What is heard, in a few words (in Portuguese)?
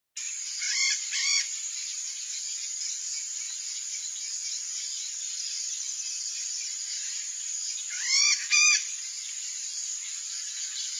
Canto do maracana do buriti